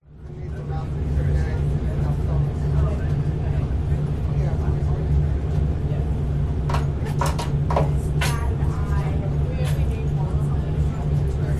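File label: boat ferry interior lounge ambience people walla engines; zapsplat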